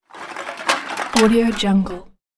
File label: aluminum cans